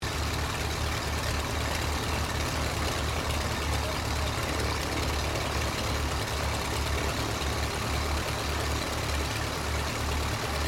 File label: AIRCRAFT DEHAVILAND DHA-3 DROVER IDLE TAXI, tri engine; Airplanes; Vehicles